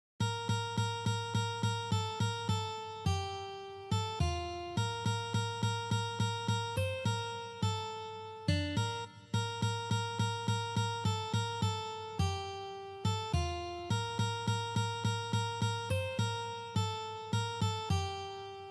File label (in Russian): Medlyak na gitare